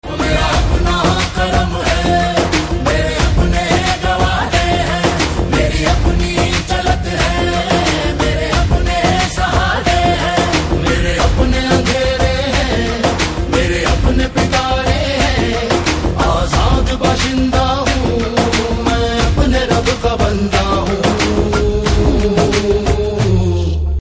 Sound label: Ringtone; Dabangg 3